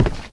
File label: wood footstep; nalc game